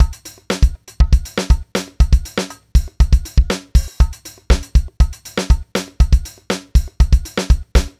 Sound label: 120 broken beat, Nu Jazz House; PowerFX